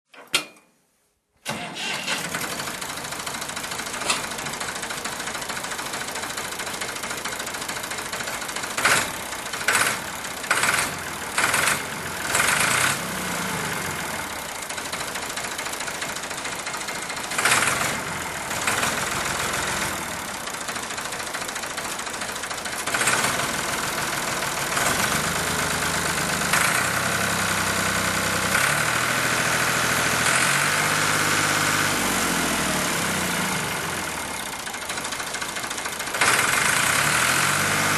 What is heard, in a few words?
massey ferguson 3500, tractors; mono